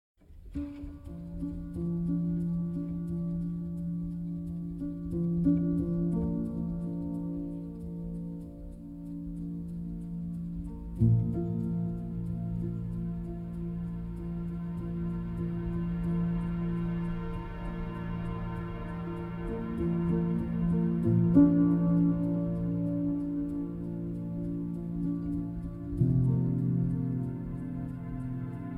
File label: piano diary